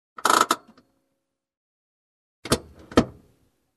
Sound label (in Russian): zvuk ruchnika